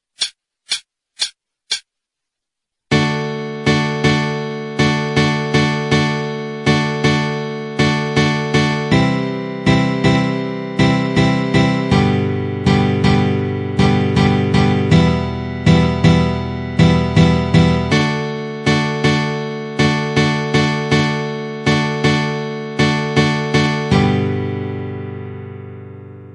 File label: Strumming 80